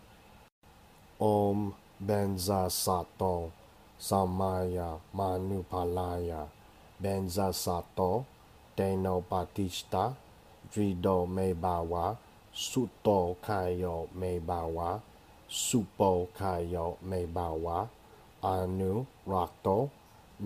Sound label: 100 Syllable Dorje Sempa Vajrattva Mantra